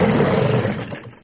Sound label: 50 hp Evinrude Boat Shut Off